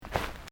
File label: FOOTSTEPS SNEAKERS GRASS STEP; Footstep Foley; Human